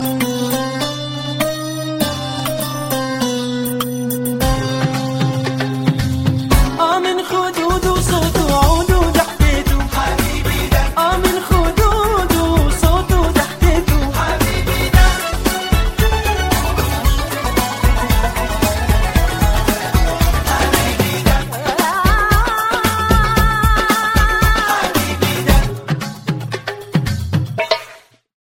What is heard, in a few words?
arabic mix ringtone